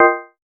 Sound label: comment noise